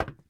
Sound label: metal box run